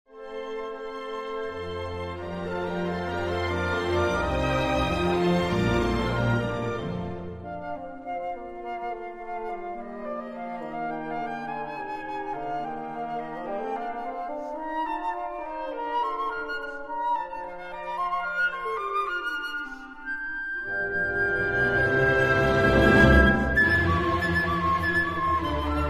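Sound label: Nielsen Flute Concerto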